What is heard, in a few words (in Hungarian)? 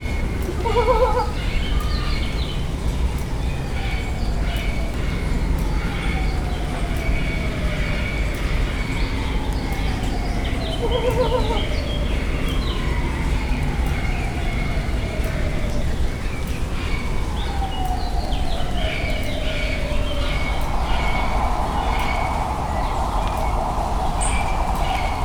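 közben eleredt az eső, tűzróka; pecsi zoo 2017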